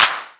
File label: Claps; Vengeance - Essential Clubsounds Vol.5